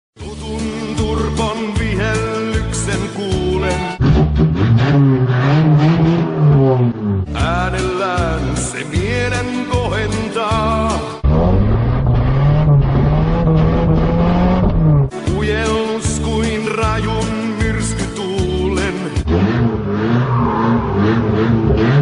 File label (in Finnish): turbon vihellys